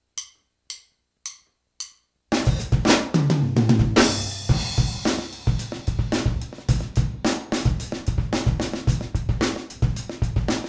Little Funky, 110 BPM, General 4/4 funk-style grooving.; drum